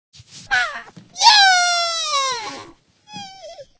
Water splash to clean

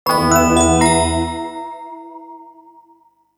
Jingle Magic Circle; culdcept revolt original soundtrack dynamix 2017

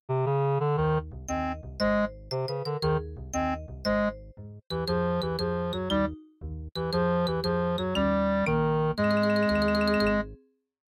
MIDI Animation stings

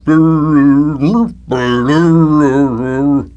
prize idle balloon foot; Prizes

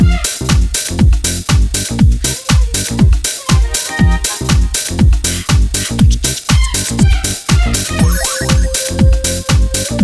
Sidechain Fever (Instrumental); Scratchin Melodii

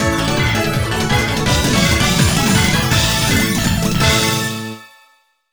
Great Result (Clear Jingle); Puyo Puyo 20th Anniversary; NDS

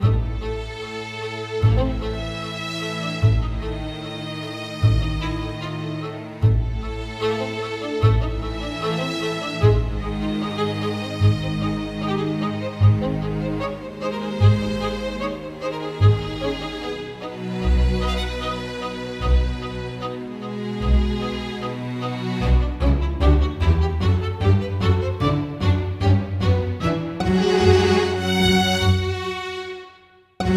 Music Protection Bowed; 7 Mages

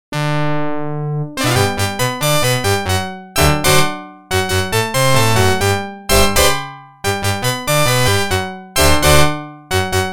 Organ; NHL 94

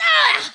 Barrel Bail Female; Kelly Slater Pro Surfer; macOS, Windows gamerip

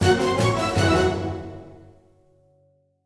Music Fight Brigands Theme Hard Bpm160 End; asterix obelix slap them all 2

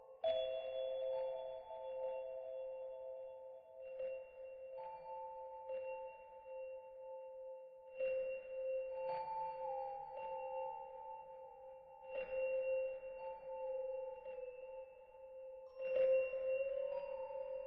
City Chimes loop

